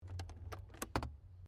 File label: CAR MAZDA RX7 SERIES3 EXTERIOR GLOVE BOX OPEN